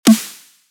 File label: snare multi